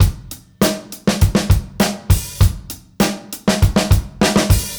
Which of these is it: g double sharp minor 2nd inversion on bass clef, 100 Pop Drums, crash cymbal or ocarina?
100 Pop Drums